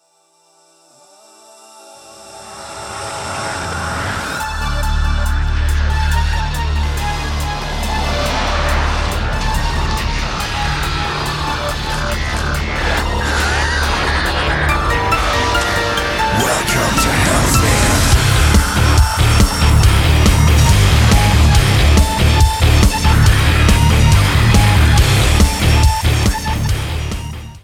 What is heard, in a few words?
Horror Nights